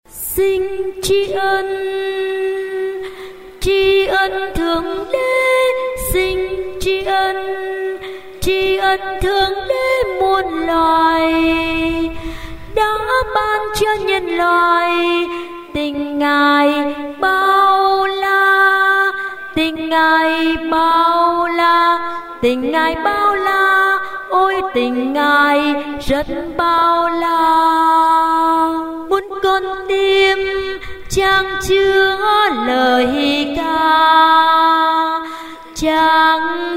alto

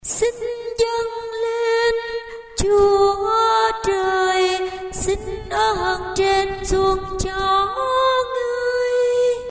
tenor

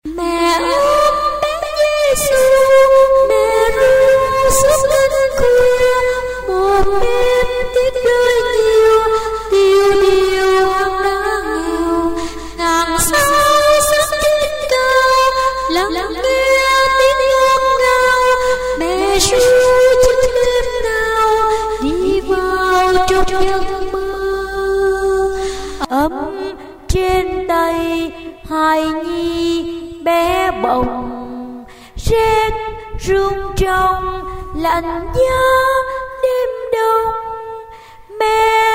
tenor basso

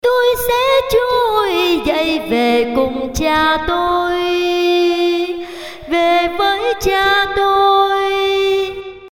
tenor basso

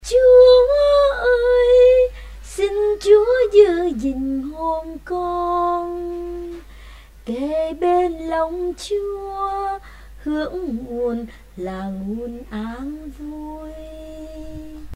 sop tenor

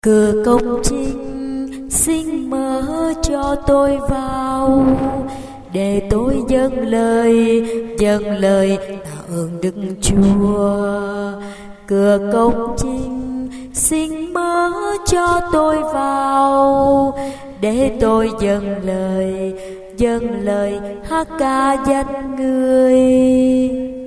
alto basso

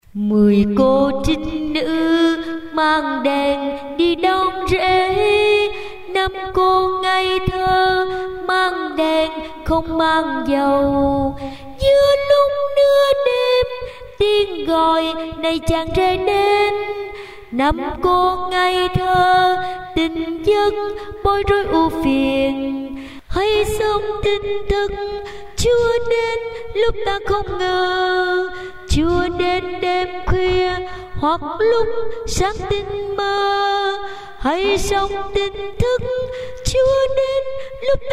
tenor basso

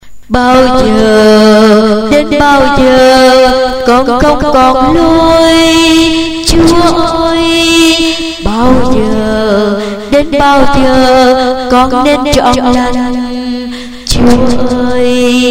sop alto

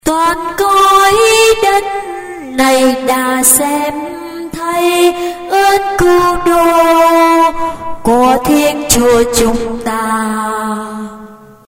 tenor basso

